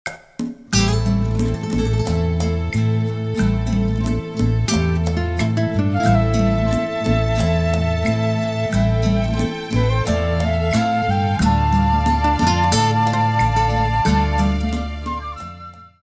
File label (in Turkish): santral fon muzikleri